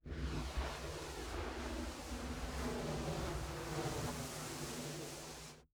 Tubular,Reinforced Black Vinyl tube,extra thick,deformations,curved,flexible,cloth; 6ft L; 1in Dia; cloth sweep; Eiravaein Works